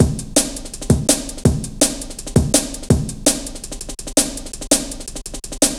Ruffneck, Drum Loops 165.5; Jungle

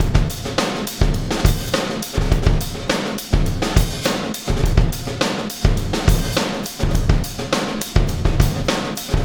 Wormhole Beat, Processed Drumkits

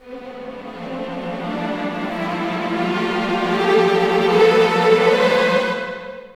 OPEN; East West; Scoring Tools; ORC; Open Theme

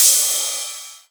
Rides, Cymbals

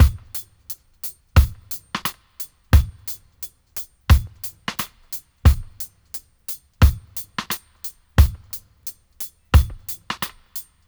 88 dry, drum loops, dub; Drumdrops In Dub VOL-1

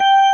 STOP ORGAN, JV 1080; Pro-Rec - Synthsonic

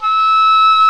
WINDS & BRASS

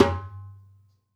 Dumbek; Essential Percussion

